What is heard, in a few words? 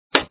Pop; btd4